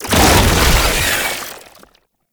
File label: Tentacle Flail Stop; Warframe; Abilities